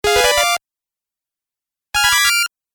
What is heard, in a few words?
JINGLE attention